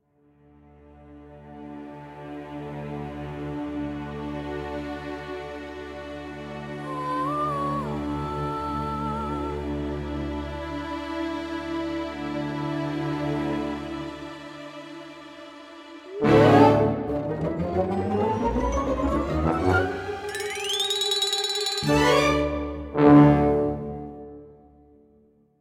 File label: mary and mice without narration; children of morta; Procedural Events